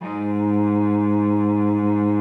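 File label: G#2, cello vibrato; Xsample VOL-7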